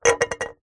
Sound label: foley can soda 200ml set down on concrete; zapsplat